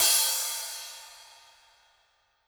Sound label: soft hit finish; osu!; Lys Renewed